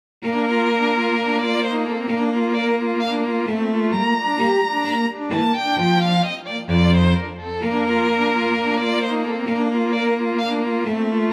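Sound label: trio for violin, viola and cello; f. shubert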